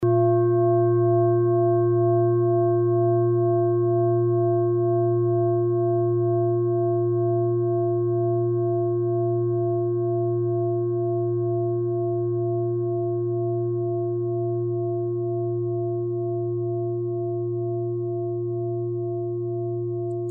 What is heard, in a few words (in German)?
klangschale set; Klangschalen-Chakraset N°7 für alle Chakras